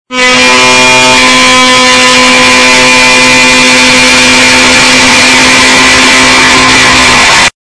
Powertool

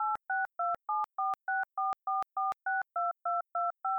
Dial

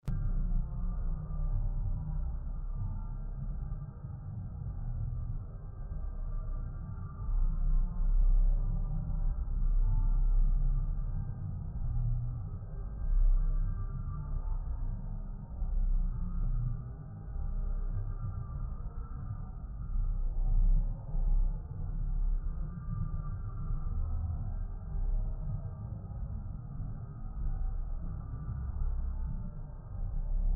Incoming

